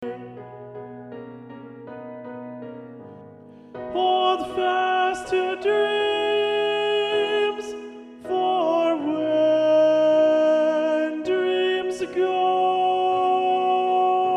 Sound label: Alto; Audition